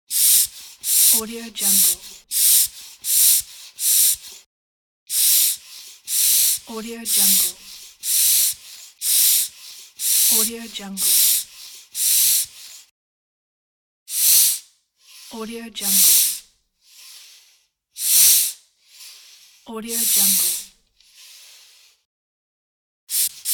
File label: bycicle pump